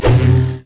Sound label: double strike; masteryi skin02